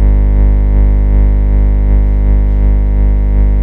Ground, Industrial

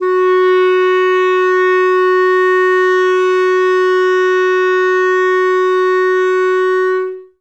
F#3, Clarinet; Best Service ProSamples vol.51; Classic Orchestra 2

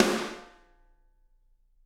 SD, Drums; Bob Clearmountain Drums I

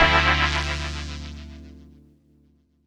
D&B Pad; Keys; neuro

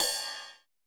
cymbal, Roland MC-909

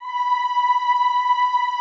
EEH B4, Extended Classical Choir; Best Service

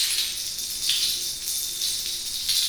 PRC FNGRC, Metal Rain; Roland - Rhythm Section; Water Perc

